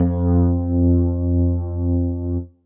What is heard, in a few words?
Snoover F2